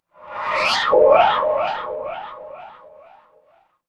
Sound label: magic scifi teleport